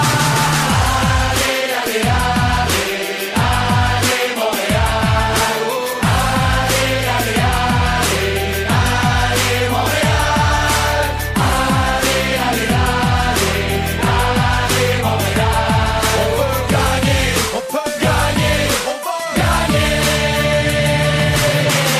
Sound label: goal song